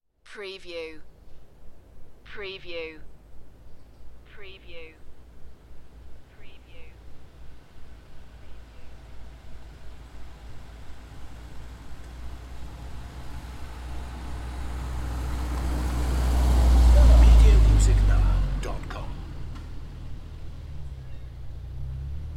Welsh Forest car park cars driving by people talking and walking; beanstalk audio